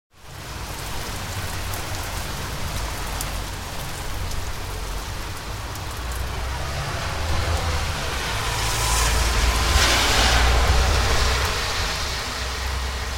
Traffic Rain, Heavy rain with some passing traffic splashing through the water.; Traffic and Cars